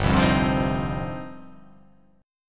G 7th Suspended 4th Sharp 5th

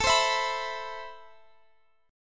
C Major 7th, A, guitar chord; Cmaj7/A; strummed

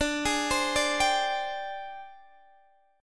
Ab Major 7th Flat 5th D, Guitar

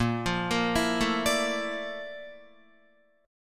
Bb Major Sharp 11th Bb down Guitar, chord; arpeggiated; JGuitar

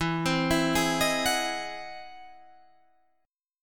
E Augmented Major 9th, Guitar, Open D

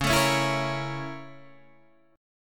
Bb Minor Major 7th Flat 5th, Db

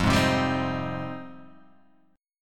F Minor 9th F

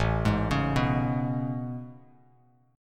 A Major 9th A, Bass Standard; AM9; arpeggiated; JGuitar

